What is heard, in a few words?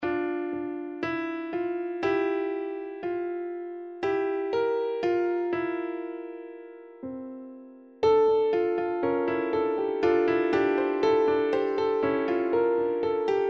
piano; Katarakt records